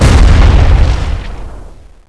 med, impact explosion; SINDEMO

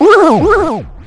pain; QUAKE2

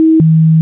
ring

